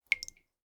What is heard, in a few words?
water drip into ceramic bowl pings; prosoundfx